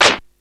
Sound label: SHAKER, ELEC PRCS; East West; Global Sounds